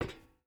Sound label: footstep boots metal walk; Rust